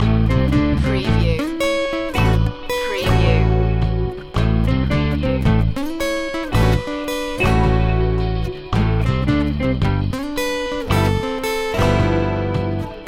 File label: sexy groovy loop